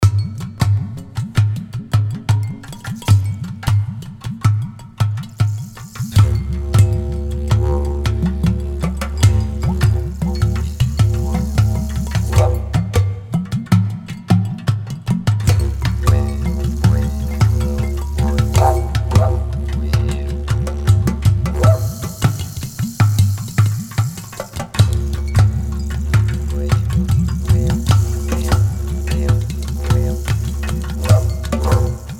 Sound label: BEAT PERCUSSIO, Beat Loop Percussion Tribal Conga Glass Clank Udu Jam; Miscellaneous Loops